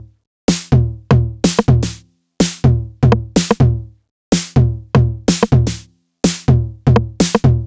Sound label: drum loop niner percussion 125, Classic Chicago House; Sample Magic; Drum Loops